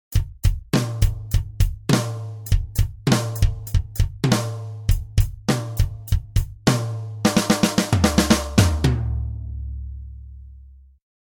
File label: drum groove